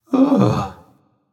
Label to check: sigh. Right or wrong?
Right